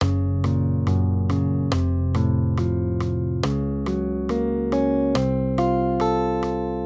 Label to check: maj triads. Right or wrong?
Right